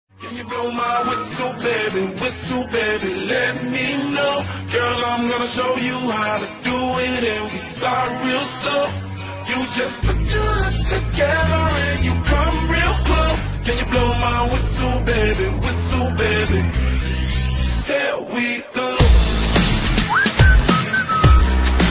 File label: whistle